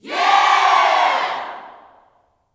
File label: "Yeah", Vocal Gospel yell shout; Spectrasonics; Vocal Planet Vol.1 Gospel; akai